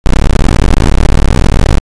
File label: die; Cavern-Quest